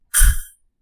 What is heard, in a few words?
Asthma Inhaler